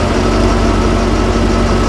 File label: jeep move; 1997 MMX LAN PACK (win 95); Army Men